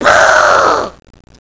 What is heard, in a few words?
boo